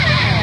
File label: Birth Ball; Barrack Unity